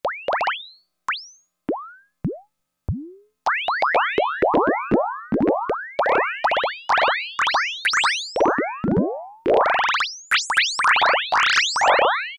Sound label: Percussive Sound, Roland Juno-60; Factory presets